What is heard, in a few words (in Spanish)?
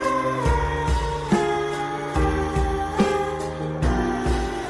sonido tristeee